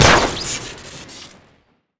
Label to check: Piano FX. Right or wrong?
Wrong